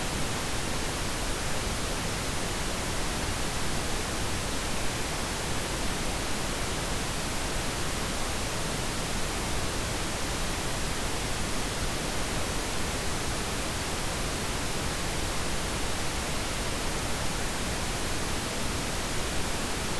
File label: pink noise